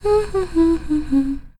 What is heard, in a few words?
hum child